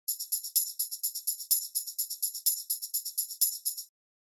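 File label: Tambourine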